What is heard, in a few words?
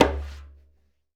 Conga Slap and Slide; One Shots; Essential Percussion